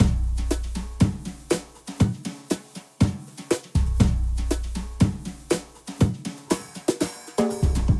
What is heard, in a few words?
Speaker Mic Boom Jazz Loop